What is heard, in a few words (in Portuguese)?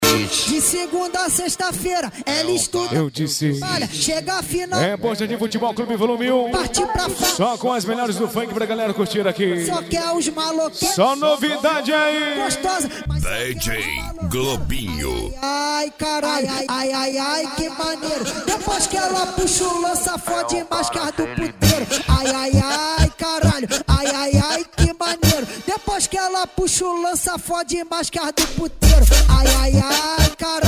funk pisadinha sertanejo